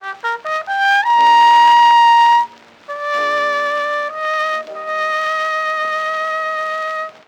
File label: Carnival of Venice variations; loc-edison